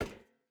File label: footstep rubber boots metal run; Rust